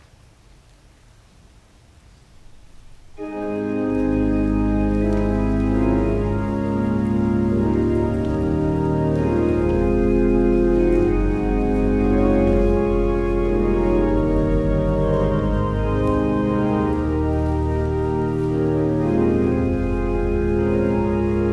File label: Organ Music; The Loving Dealings of the Risen Saviour with His People; Netherlands Reformed Congregation, St. Catharines, ON